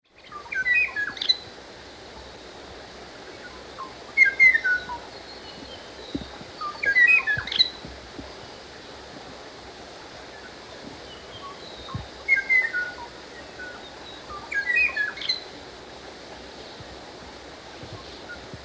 HAWAIIAN BIRD; wilderness songs

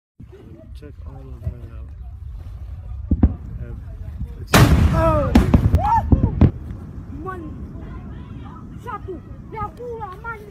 Sonic boom Hunga Tonga Hunga Haapai Volcano; wilderness songs

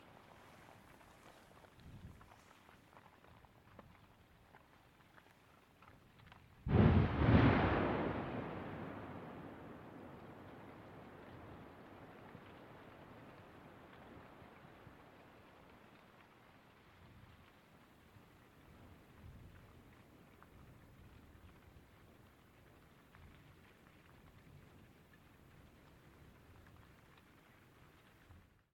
stromboli eruption; wilderness songs

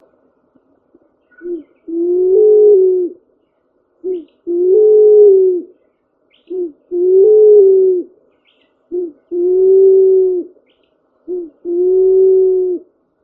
Pink Pigeon song Mauritius Black River Gorge; tropical birdsong

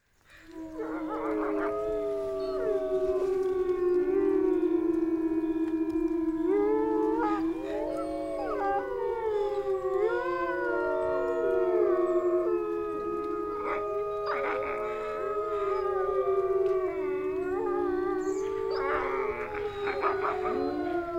WOLF PACK HOWLING, wilderness songs

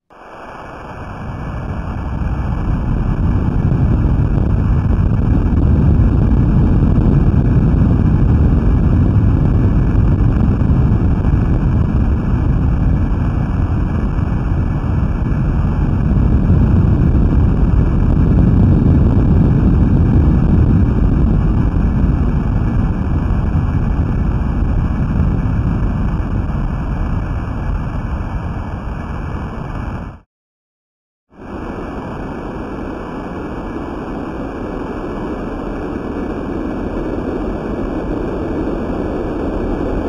Japan Earthquake; wilderness songs

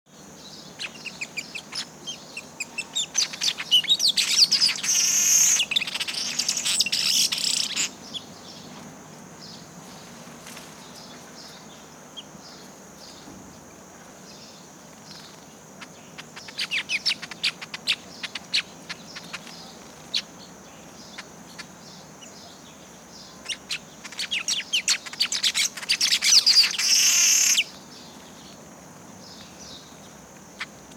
Village Weaver Ploceus cucullatus; tropical birdsong

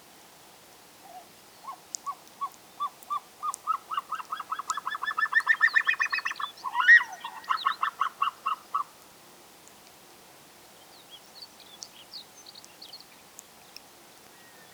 Himalayan Snowcock Tetraogallus himalayensis; wilderness songs

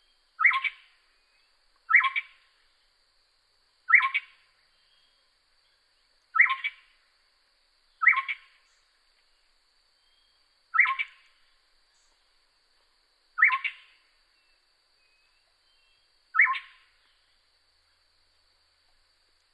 Yellow crowned Gonolek Laniarius barbarus; tropical birdsong

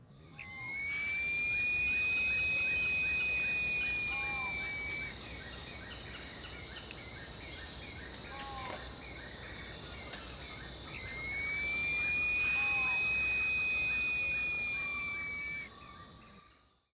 SLOTH SCREAM; wilderness songs

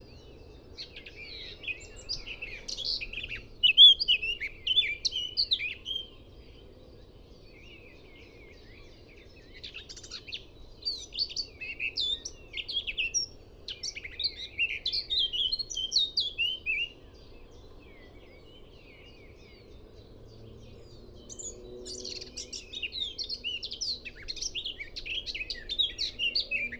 Eurasian Blackcap Sylvia atricapilla; wilderness songs

